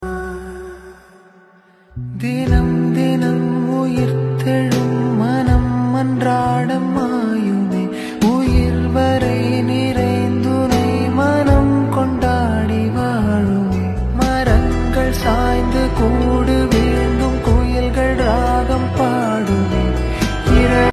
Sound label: Tamil Love Ringtone BGM Ringtone; Sad